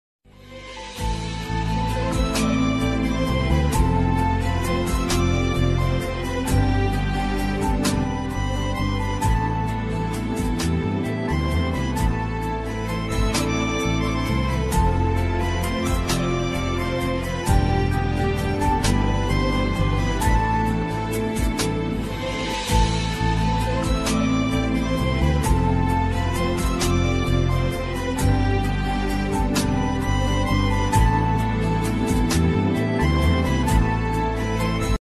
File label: serial instrumental ringtone; naagin 3 serial